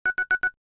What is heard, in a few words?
Zoom In; LG; KH5800